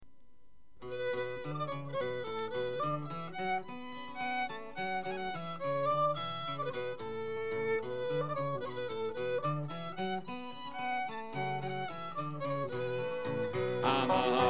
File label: hard working boater song